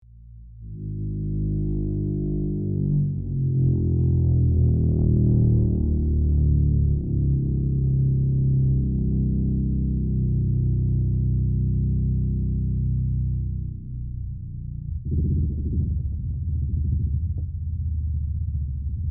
Earthquake designed deep rumble vibrations

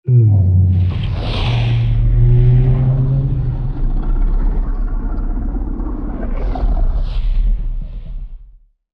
Large aquatic dinosaur underwater call

